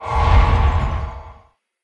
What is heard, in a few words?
holy shock; csgo; wcs go